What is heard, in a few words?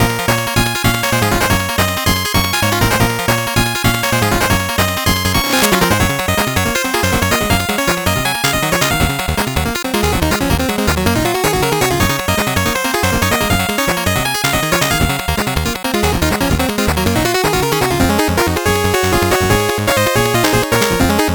8bit bgm fast